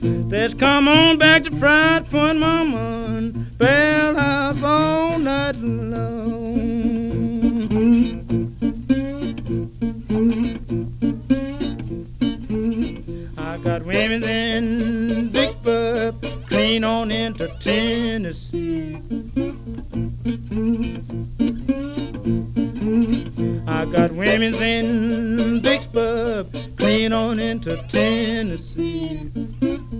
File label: blues